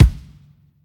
thumpy kick drum single shot b key, kick single hit tuned to the B note